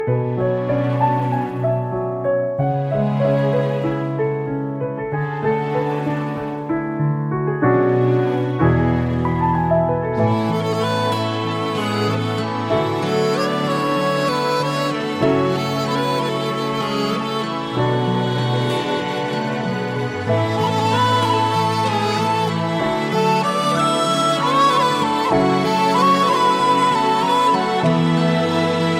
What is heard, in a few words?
instrumental; Besharam; Indian